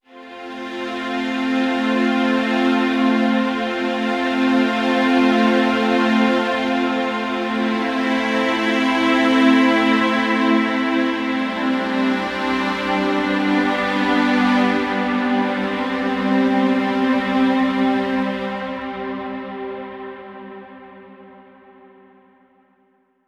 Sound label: Mid Strings Am 65Bpm; Construction Kits; STEMS; Ghosthack; SkyRealm; Kit 30 - Darkest Hour